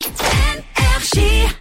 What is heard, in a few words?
Jingle; HYPER ID; NRJ; RAPID 2023